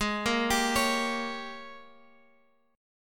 Ab Major 7th Suspended 2nd Suspended 4th Ab Guitar Open G